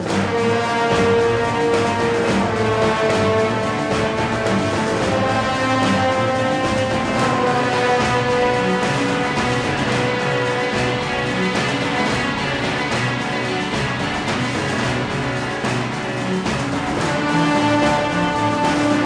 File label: Action